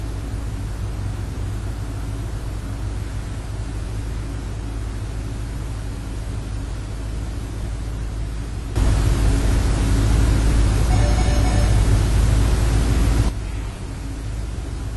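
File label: Church Pulpit cell phone; Community Methodist Church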